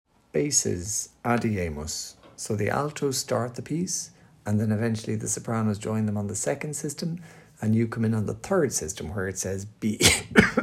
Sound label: Bass